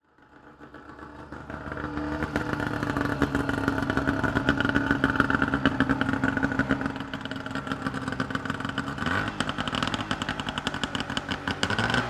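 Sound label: Speed Boat Engine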